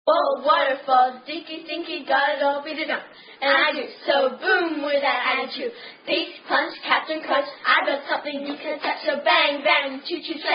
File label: Children's Rhyme; Brick Wall